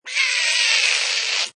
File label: Critter, Creature screech: Small angry monster, alien or fantasy creature hissing, screeching; Monsters